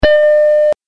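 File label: Apple Macintosh SE Boot Sound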